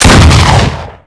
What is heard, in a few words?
shotgun shot